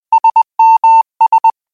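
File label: Sms Morse Code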